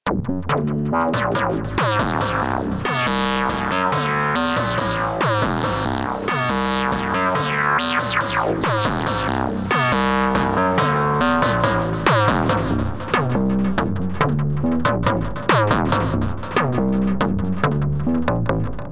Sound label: bass line; pro midi